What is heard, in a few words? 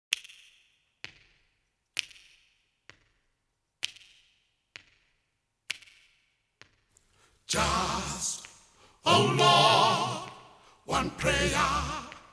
Acapella